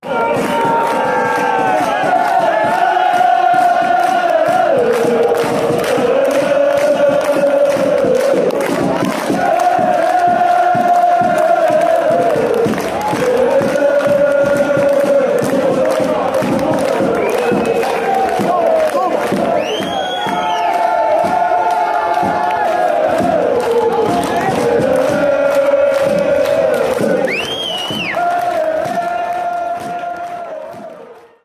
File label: lalalala lalalalalala fanchants